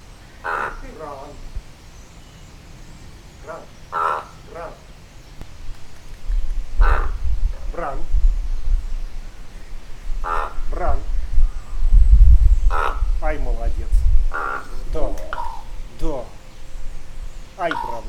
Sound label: Corvus corax; A talk of an adult raven with a human